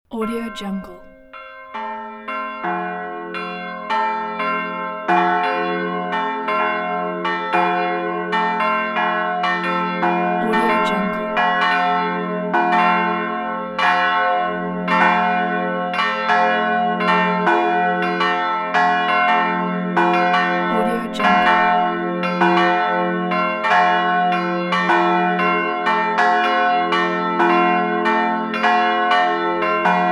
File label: triple church bells